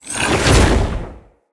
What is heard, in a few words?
Staff Caster Cast Long; Weapons; Staves; Dendrit Staff Caster